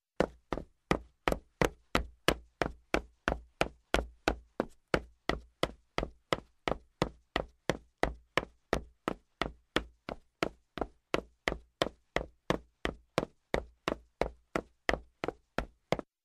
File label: footsteps wooden boots fast